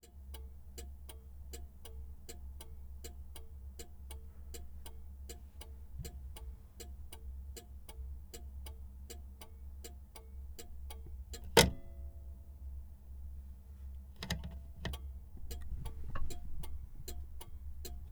volvo 122 turning signal clicks; Volvo 122 Amazon 1970 Police Car; MKH8060; Pole Position

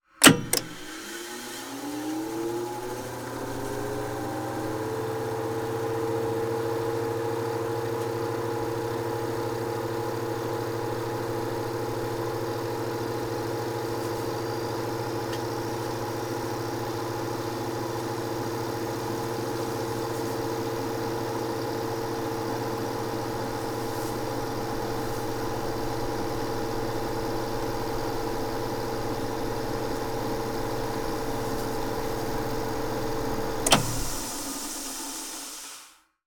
Tape machine fast forward stop long; akg; SkewSound; reel2reel

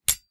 sword; Kenney's Sound Pack

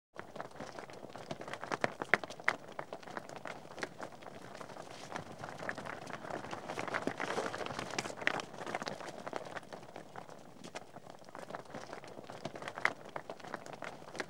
Amidst A Herd Of Donkeys; Animals and Birds; BBC Sound Effects Library

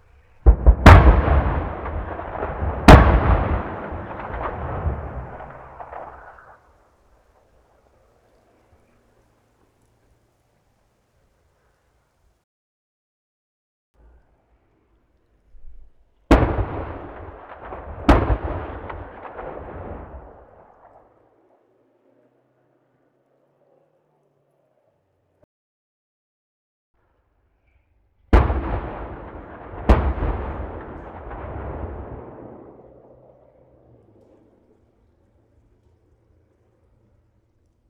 warfare cannon firing forest distant; MKH8060; Pole Position; The Warfare Library

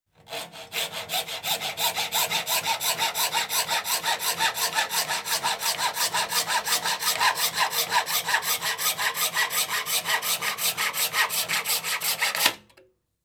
Hacksaw, Small Piece Of Formica; Construction; BBC Sound Effects Library

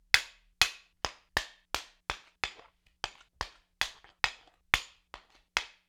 Axe Katana Haft on Blade, Weapon on Weapon; StillNorthMedia

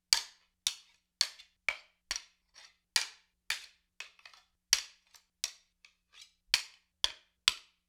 Seax Katana Blade on Blade; StillNorthMedia

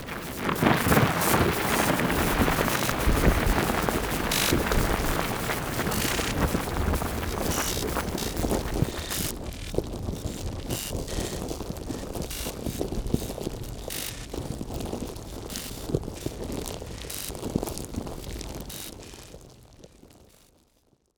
Clicks, Designed Plastic; Gregor Quendel

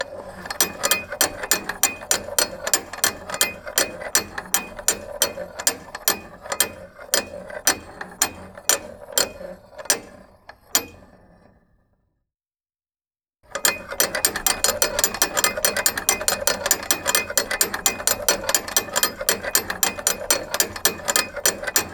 large gear metal slow; Mindful Audio; Elements - Gears

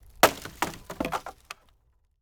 tree dead tree limb impact; Foley Elements Foliage

